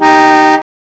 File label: HORN; CMI Sound Library Rev 1.3